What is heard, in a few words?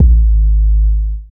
BD Sub; VEC1; Club